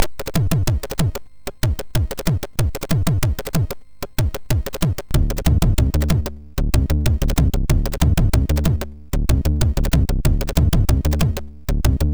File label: MOS6581R4 sid; Flying Cavemans